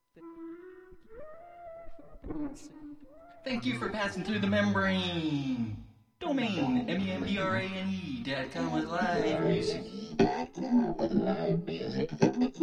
Jig; The Magic Jig

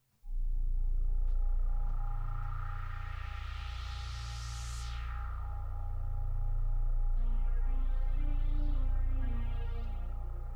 Soundcheck; Gift Shopping

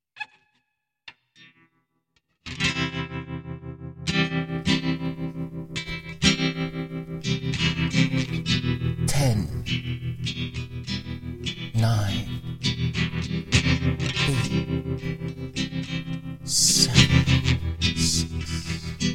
song and dance

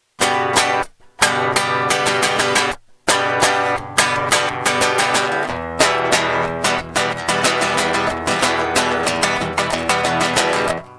acoustic guitar

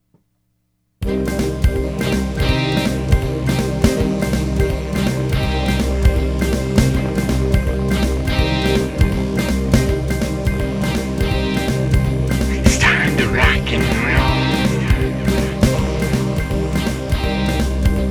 Rock And Roll Soundcheck

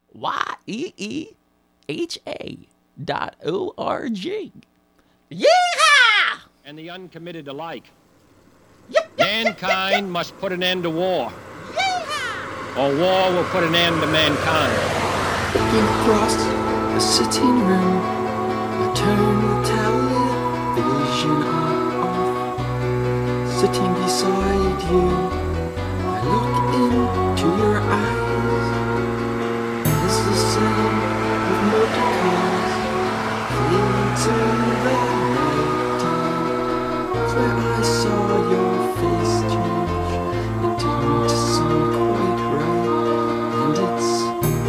vocal roughmix

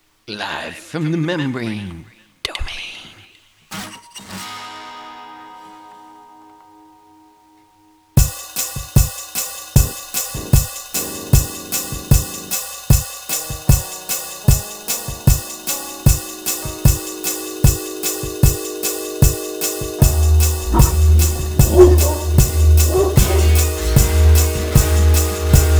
soundcheck

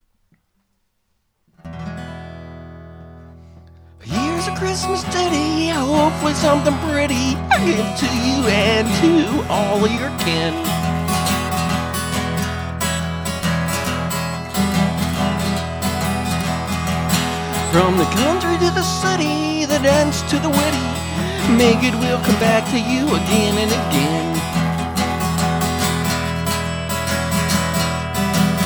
Christmas Ditty with Pick

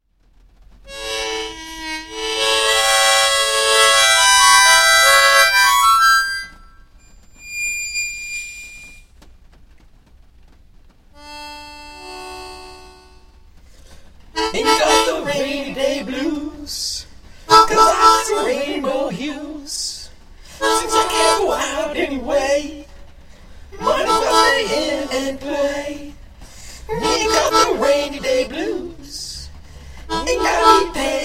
rainy day blues

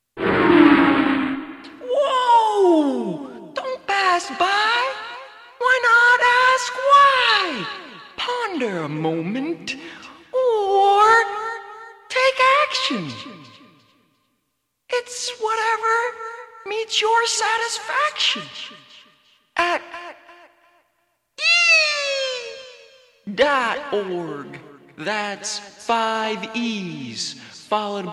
6 string passion thing

